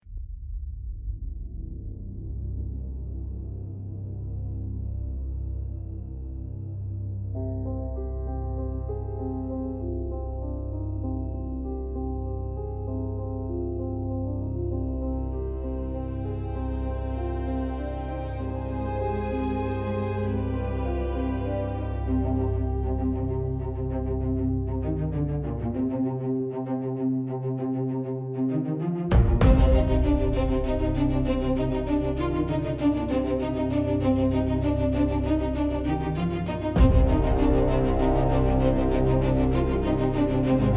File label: intense emotional motivational music